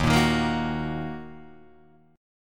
D sharp Minor D sharp down Guitar DADGAD

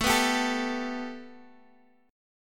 A 7th Suspended 2nd A Guitar Standard, chord; strummed; capo 3; x,12,9,12,10,x

